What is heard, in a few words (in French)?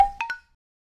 bambou mel